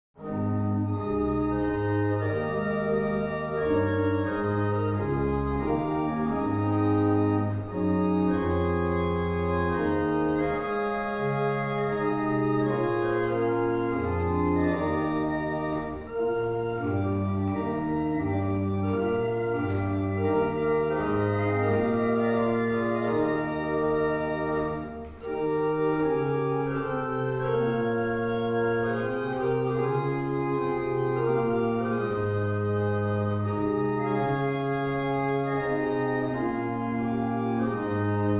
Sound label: barrel organs; Shelland